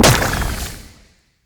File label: Shrimp Lantern Destroy; Creatures; Baliq